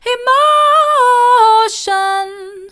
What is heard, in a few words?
emotion mid, vocal